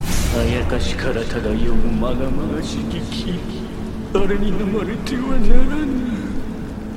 crying japanese or something

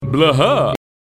laugh react

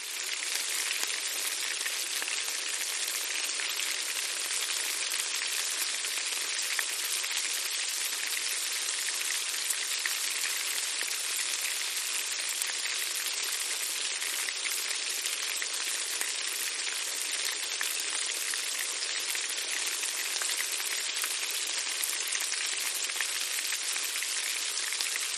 sizzling hand on stove

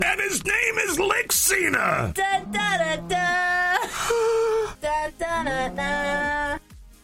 bacon lick; cena

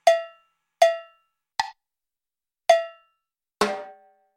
thud kinda; sesame street

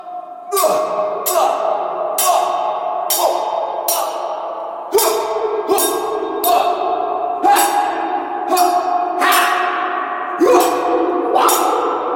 sword fighting

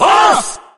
soft hit whistle

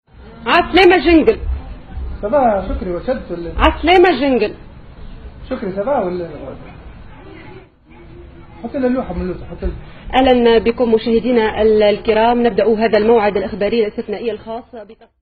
jingle; 3aslema

